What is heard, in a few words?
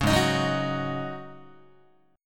G sharp 7th Suspended 4th G sharp, Guitar; G#7sus4; strummed